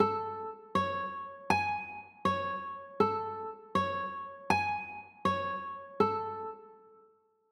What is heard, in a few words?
a maj7, chords